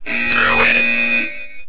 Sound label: blare; druid